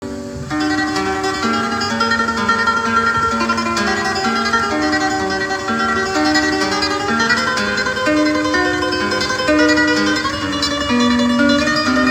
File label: Tremolo